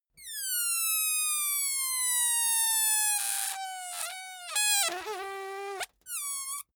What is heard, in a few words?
balloon air release squeak glitchy